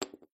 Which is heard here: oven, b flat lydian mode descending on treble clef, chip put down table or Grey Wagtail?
chip put down table